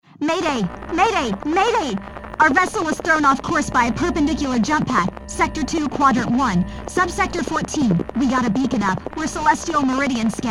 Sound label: Distress Signal; Mothership; Mothership Distress Signals v1